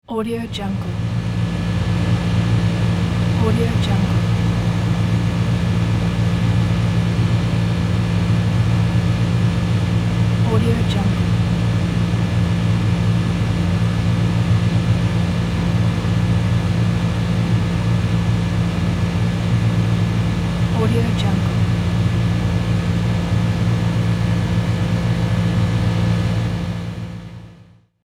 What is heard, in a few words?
industrial refrigerator